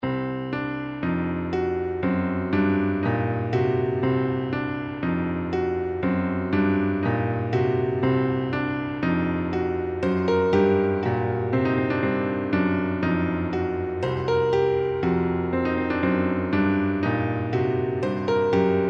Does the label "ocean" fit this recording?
No